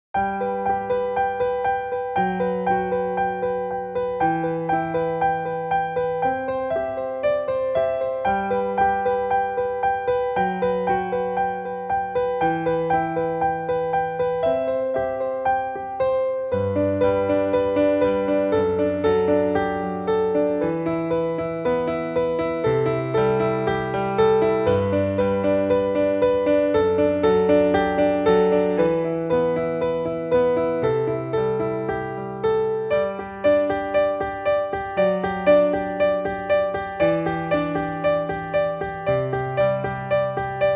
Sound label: Instrumental Sad Music